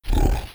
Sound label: wallmonster chase; Crypt of the NecroDancer